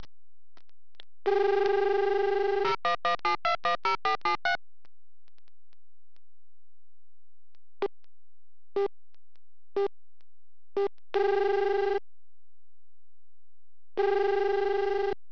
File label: long ring